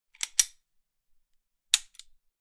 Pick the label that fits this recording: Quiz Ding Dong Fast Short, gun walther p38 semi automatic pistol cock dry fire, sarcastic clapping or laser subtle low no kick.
gun walther p38 semi automatic pistol cock dry fire